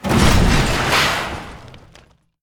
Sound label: objects, World of Tanks